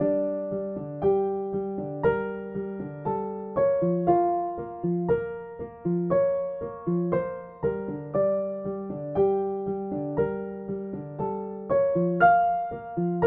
Retro Piano Loop

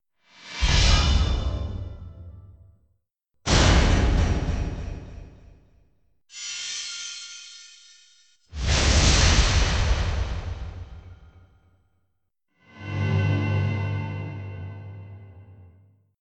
Magic Spell Casting

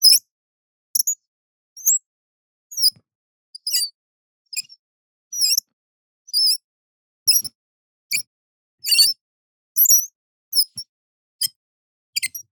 Squeaky Outdoor Tap

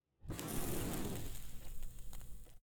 Cigarette Burn

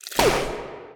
Laser Gun

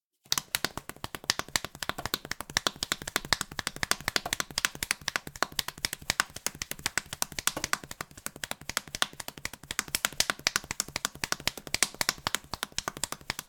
Cartoon Running

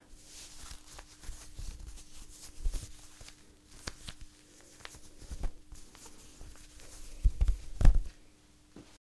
Clothes Fold

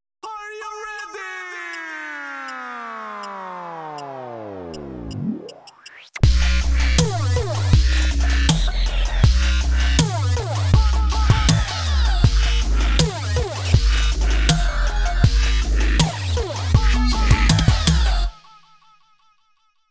160 Bpm Generic Dubstep Loop

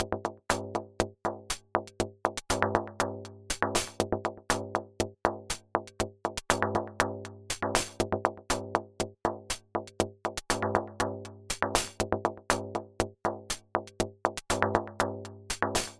Perc Loop

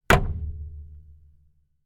Bass drum Clack Noise

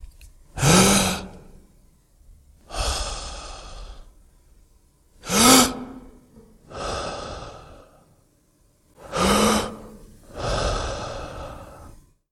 Man Breathing Deep Breathe

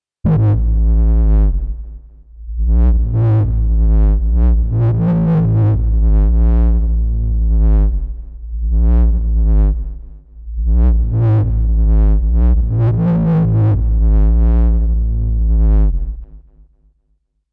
Sub Bass Wimpering Whining 120bpm

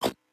Cursor

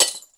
Glass

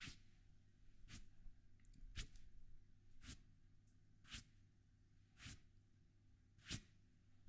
Dusting Mattres

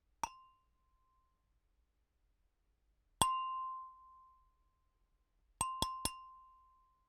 Cheers

